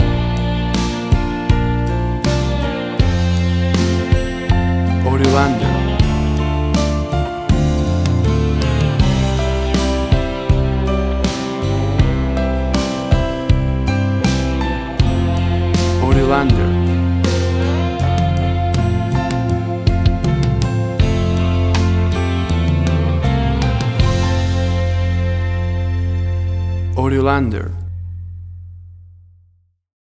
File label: Country Ballad Romance Music Theme